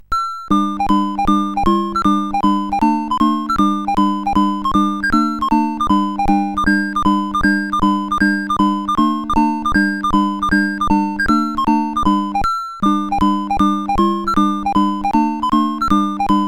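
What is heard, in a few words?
Maxi Golf, MOS 8580 SID chip music, games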